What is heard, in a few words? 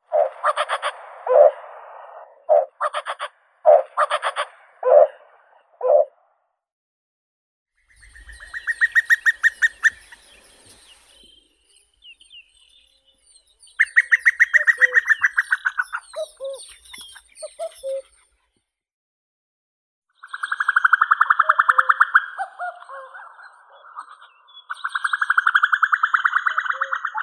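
common cuckoo, Cuculus canorus; kukushka obiknovennaya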